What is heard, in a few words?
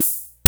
KORG SR120